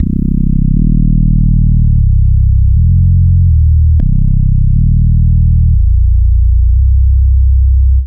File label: bass amb, Loops